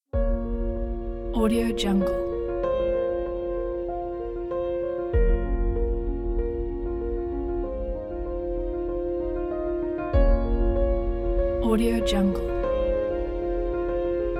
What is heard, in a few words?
emotional cinematic